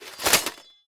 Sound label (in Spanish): GUARDIAS CERRAR PASO